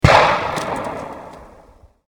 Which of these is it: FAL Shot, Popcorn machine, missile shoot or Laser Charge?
missile shoot